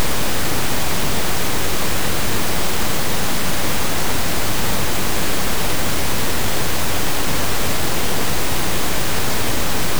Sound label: pink noise